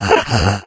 become alert, infected

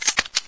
p90 clip out; Source Engine; cstrike

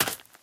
gravel, footsteps run; player; source engine; left 4 dead 2; survivor

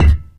physics cube impact soft

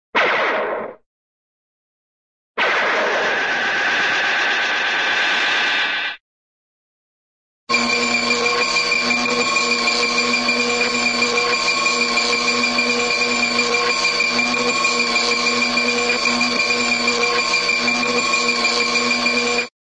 sci fi delta ray beam shot; cartoon

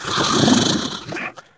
ballbot impatient

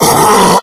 death, infected male; left4dead; Source engine

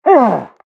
pyro taunt head pain; tf

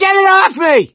scream while pounced; voice; source engine; left 4 dead 2; survivor; gambler

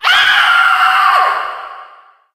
female death, witch voice die

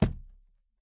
phys cube, physics

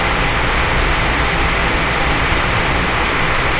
inside tape machine; video games; ambient; Source Engine; tf; lair

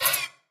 editor panel lower, ui; Source Engine; Portal 2

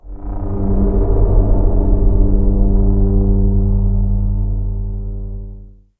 a glimpse of death, music; Left 4 Dead 2; Source Engine; video games

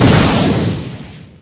vort explode

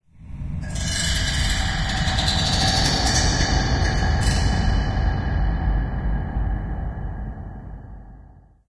amb click stick, ambient organic; video games; source engine; portal2

